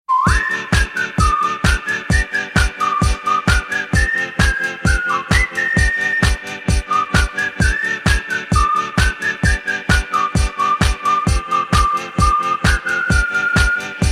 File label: fun whistle